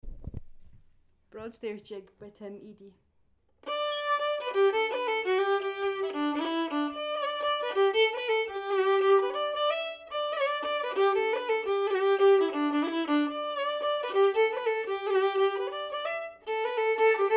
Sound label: Jig